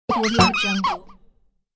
comic tease giggle game sound

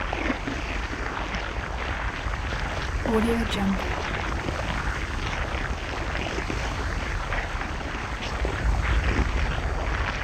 organic swirling tone

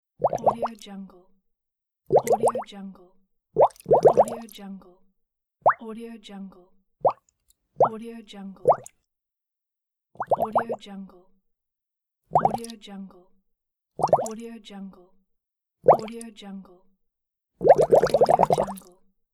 bubbles

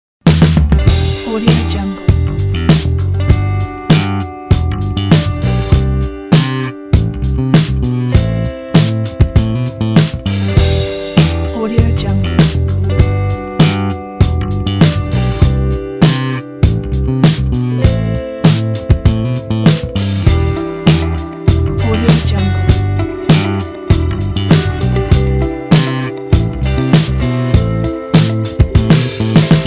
cool dark soul groove

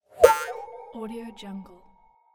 pop cartoon; volkovsound

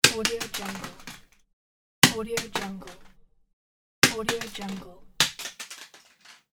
throw paper on the table

